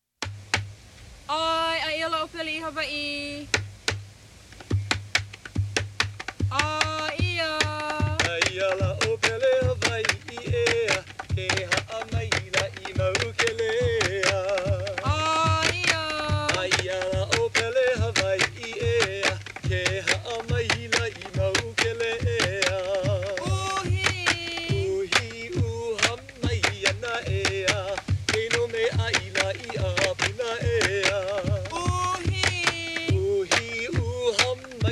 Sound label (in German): polinesischer fruchtbarkeitsgesang; Suedsee